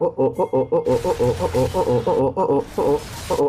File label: ohohohohohoh